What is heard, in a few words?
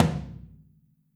FLTM OH L, Kit OH Mic, Drums Percussion